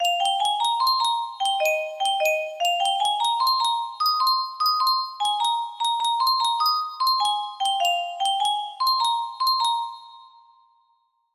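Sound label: donation sound reverb, music box melody; whitecats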